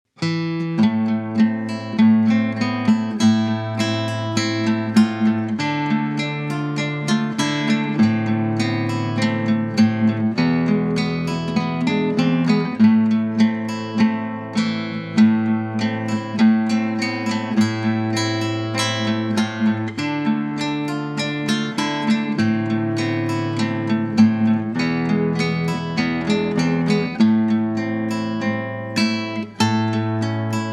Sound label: tanz, Gitarre; klassisch